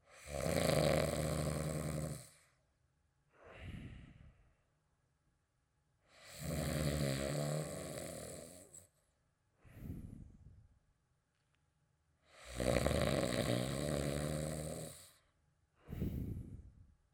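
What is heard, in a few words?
snoring sound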